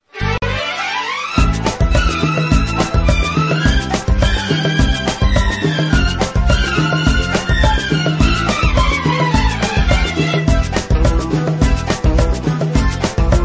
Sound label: Karaoke